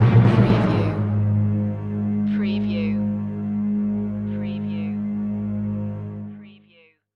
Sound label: Music Orchestra Hits